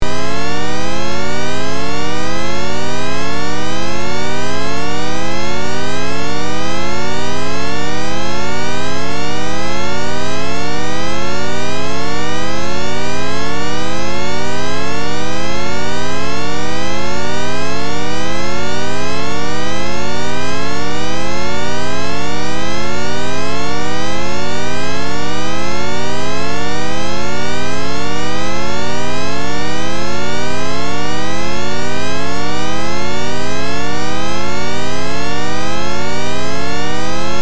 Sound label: shepard up; 無限に上昇するサウンド